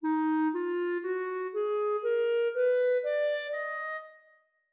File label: harm minor Eb4